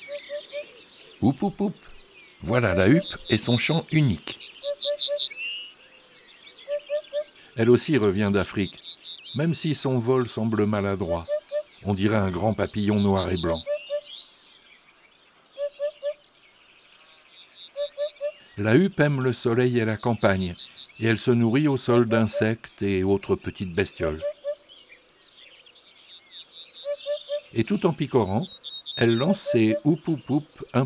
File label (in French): La Huppe fasciee; Les 4 saisons des chants d'oiseaux